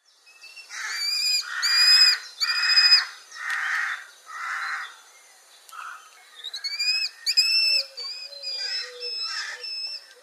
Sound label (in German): Rotfussfalke Rufe Weibchen; Brutzyklus